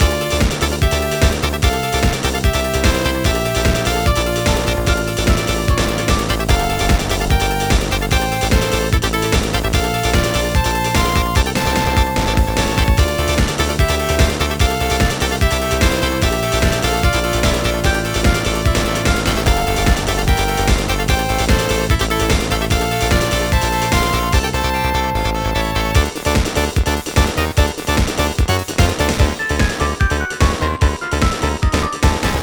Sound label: Turn Coat Reveal Loop; fashion police squad beta